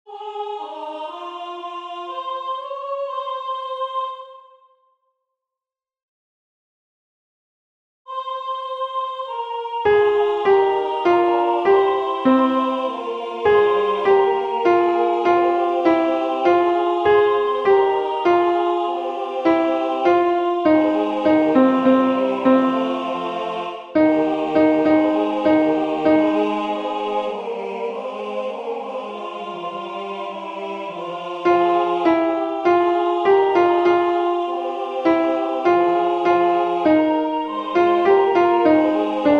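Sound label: Ave Maria Alto; Victoria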